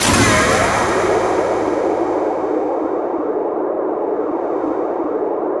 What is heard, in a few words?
HYDRA BLAST; Spectrasonic Distorted Reality 1